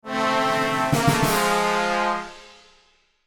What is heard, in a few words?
Fanfare you lose fail game fanfares; misc musical